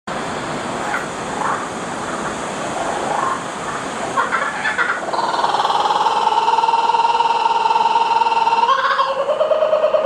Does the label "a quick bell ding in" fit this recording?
No